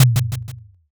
taiko

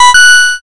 correct

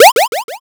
popup

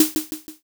snare

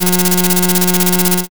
slot spin

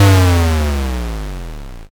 explosion

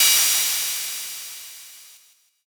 cymbal

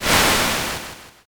swoosh

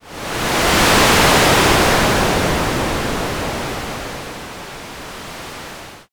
wind

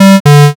error

